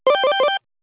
Exec Mid, Business Rings

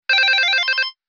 Ringshifter

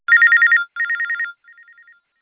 Pro Delay, Business Rings

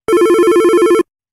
Normal Ring Lo

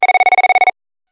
System 1x, Business Rings

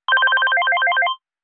Ringshifter Pro, Business Rings

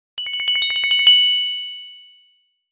Dancing Chime, Business Rings

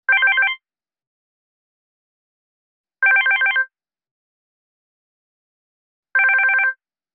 Ringshifter 3x, Business Rings

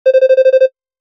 Office Pulse Med, Business Rings

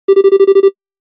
Office Pulse Lo, Business Rings

